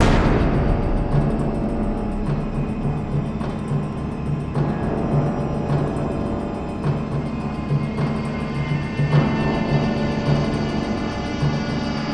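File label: PERC LOOP; gamerip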